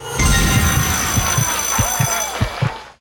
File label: big win